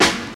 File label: smooth steel snare drum a sharp key, snare sample tuned to the A# note; snare drum samples